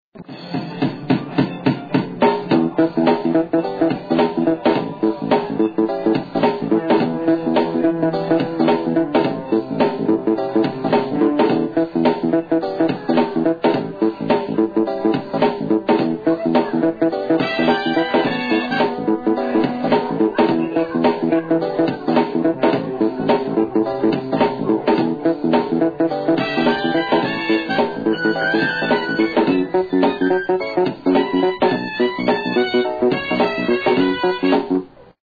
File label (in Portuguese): batida